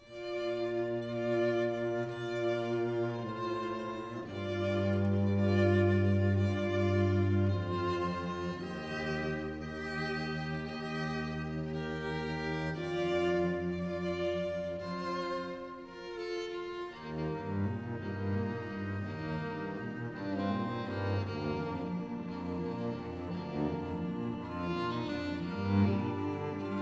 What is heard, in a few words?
String Trio Ensemble